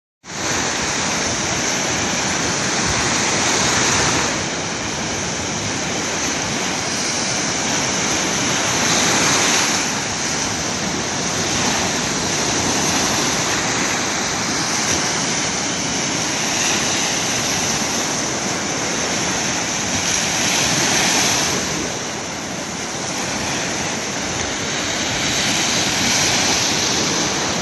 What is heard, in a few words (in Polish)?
morskie fale